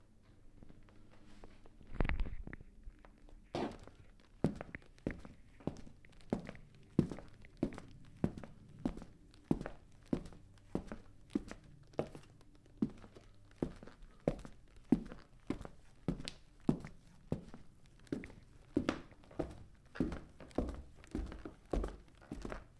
Footsteps

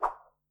Sword Swing Medium

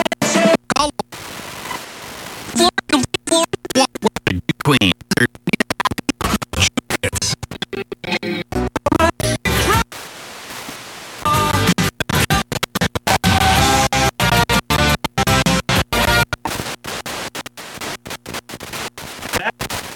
Radio Station Surfing

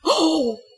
Gasp Hoh

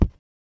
Pen

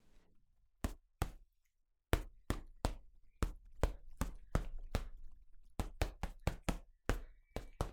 Punching Bag

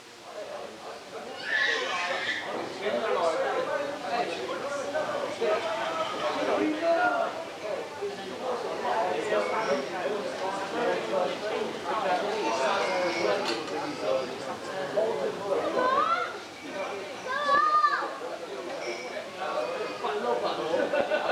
Restraunt

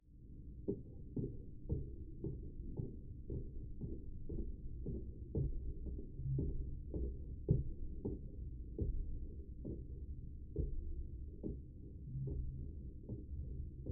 Tinnitus Hearing Footsteps

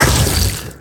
Iceball

